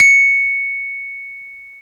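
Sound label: SCARY RHO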